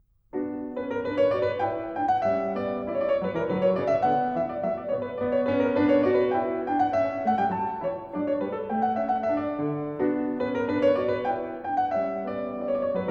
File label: Anglaise, B Minor, klavier; French Suite #3; BWV 814; BACH